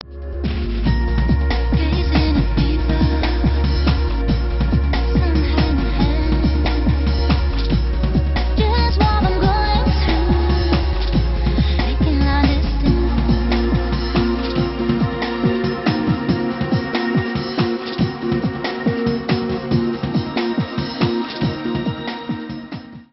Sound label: club mix